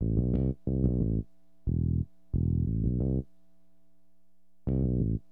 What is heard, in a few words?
BASS, HipHop; LiveAct Pool